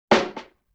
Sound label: Rim Shot Recoil; Vintage Cartoon; Drums & Percussion